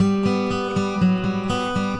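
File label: acoustic guitar turnaround, 120 bpm; GUITAR LOOPS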